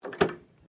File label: TELEPHONE OLD BAKELITE INTERCOME PICKUP